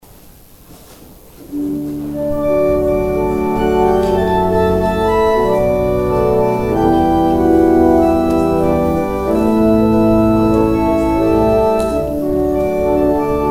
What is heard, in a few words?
organ interlude